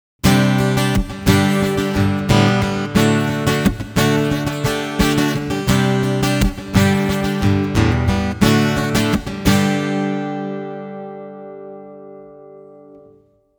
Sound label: Trace Elliott Reverb